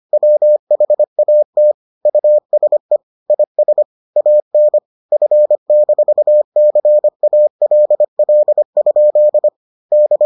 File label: morse